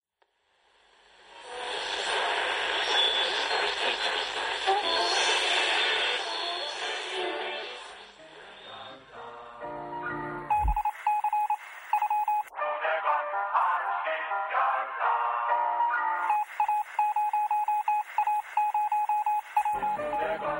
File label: Noise